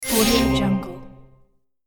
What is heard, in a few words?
magic paper slide